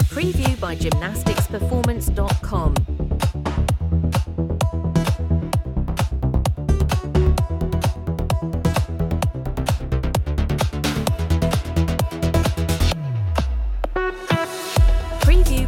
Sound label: electronic dance music